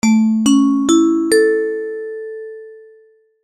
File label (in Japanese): お知らせ・始まり; 戏剧音效